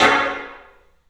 CLUB SN VRB, 3D Drums; 3D Sound

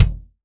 FBD MF ATT, FUSION KICK

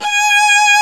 ARCO VIOLA, Solo String; E-MU Producer Series Vol. 2; More Studio Essentials; Composer; Solo String Combo

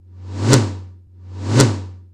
tom, drums; CMP - Analogon

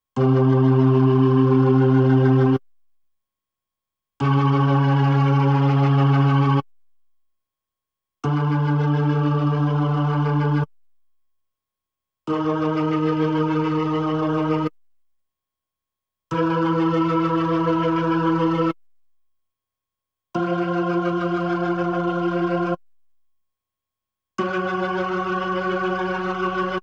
B3 Organ; Masterbits CLIMAX 8

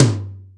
WS TOM2; Back In Time Records; Korg Universe VOL-1; DRUM FAMILY

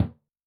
Surdo M, Yamaha DTXpress-II, Perc

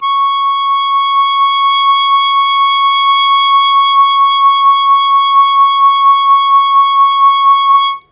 Long Sustain Fast Wah Wah C#2, Harmonica; Zero G - Harmonica

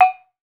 PANARUS; Metalophones; E-MU Producer Series Vol. 7 – Old World Instruments

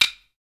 Sticks; SB Sticks

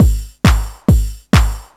hi kick together clap

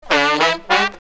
TURNTABLES, Hip Hop

